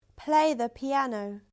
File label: piano